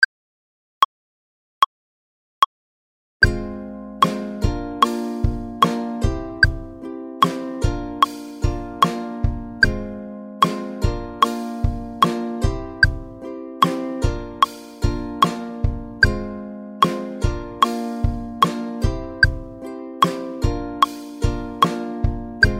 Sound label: Happy Strumming Verse Half Tempo